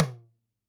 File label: TABLA, PERC